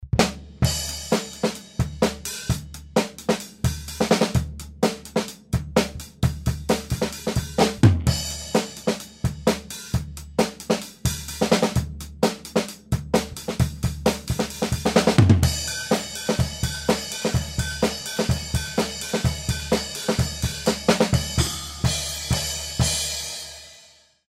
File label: Drums